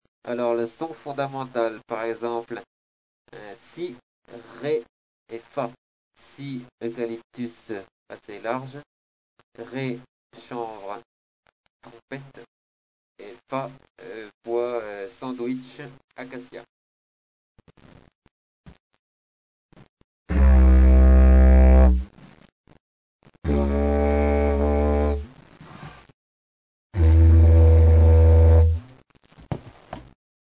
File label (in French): son fondamental